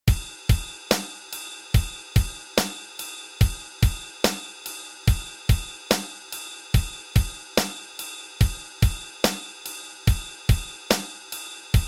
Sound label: rock basic, rock basic rhythmen